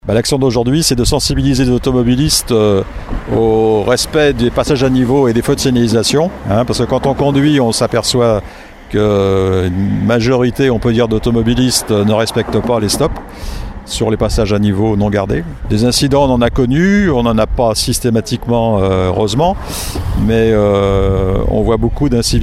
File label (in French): train mouettes